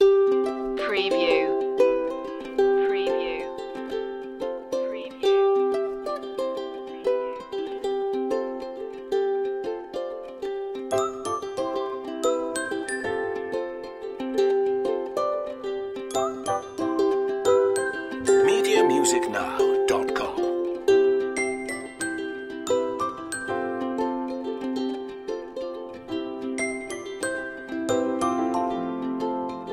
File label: the toy box underscore